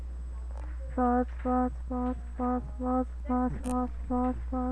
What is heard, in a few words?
buzz